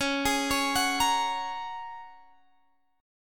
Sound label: Bb Minor 7th Sharp 5th Db